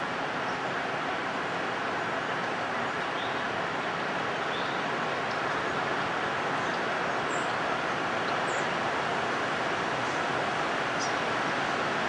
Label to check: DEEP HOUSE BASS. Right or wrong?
Wrong